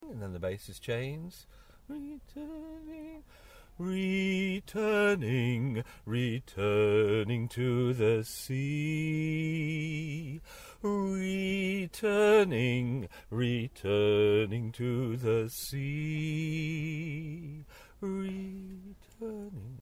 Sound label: Bass